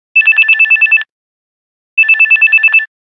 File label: Nokia Buzzer Desk phone hi; Windows Phone apps